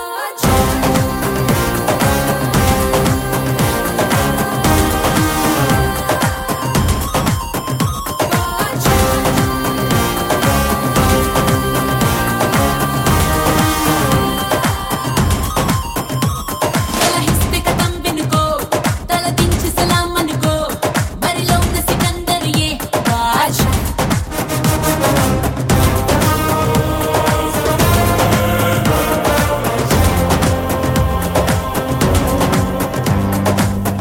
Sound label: Ringtone